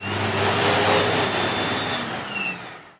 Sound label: Vacuum; Nickelodeon - Capture The Slime